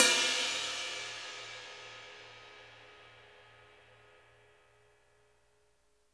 bell, drum kits; Sonic Reality; Interactive Drum Kits

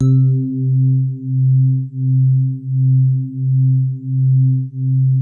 SWEETER C2, E-PIANO; Music Store - Claudius Diemeter Schwerlos

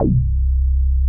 Moog C1, analog bass; Music Store - Claudius Diemeter Schwerlos

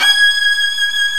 Arco Violin G#5; Proteus 2000; Multisamples; Digital Sound Factory Proteus 2000

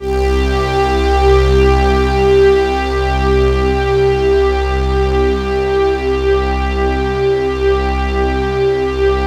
Pad C2, Jungle; USB Soundscan vol.05; Explosive Jungle; AKAI; 90s sample CD

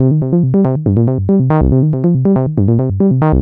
Synth, Prototechno; Sonic Foundry; Synths

